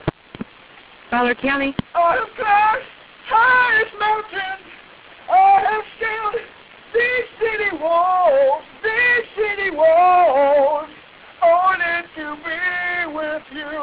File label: singing